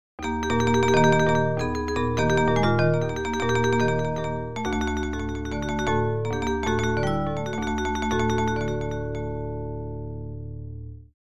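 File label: orchestral mallet vibraphone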